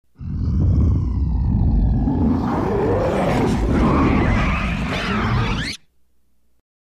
Alien Growl Large Cre

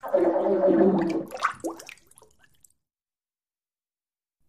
Bathtub Fart Bubbl; farts

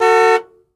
Jeep Chrke Horn Honk; jeep cherokee 84

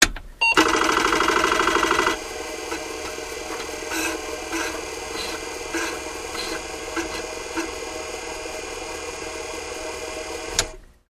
Computer Switch Beep; beeps & tones

